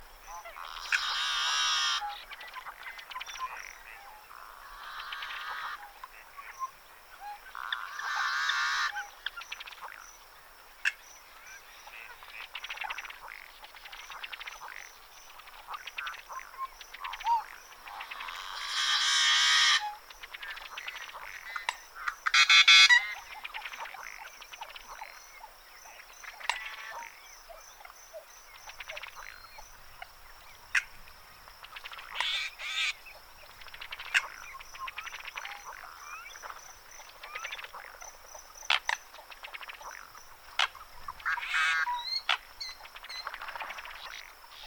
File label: Yellow headed Blackbird